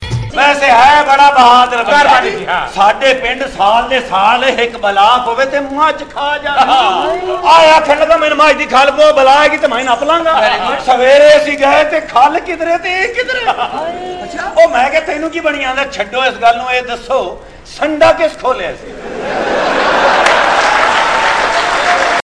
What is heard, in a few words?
drama ringtone